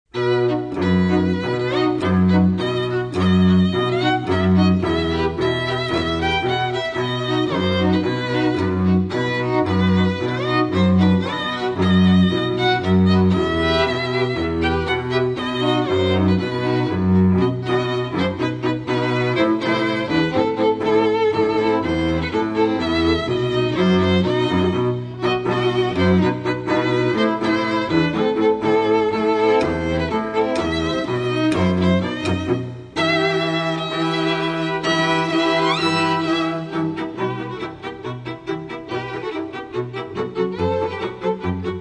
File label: Jewish Traditional Hava Nagila String Trio